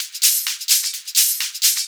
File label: Hihat Loop 128 BPM